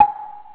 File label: timer 3 2 1